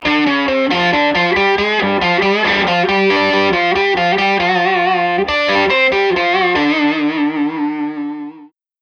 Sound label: Ld Gtr